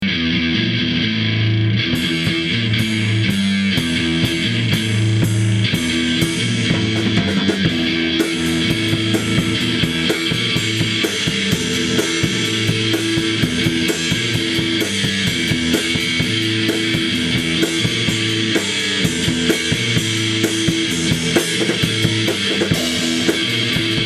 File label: Metal Nightmares, jam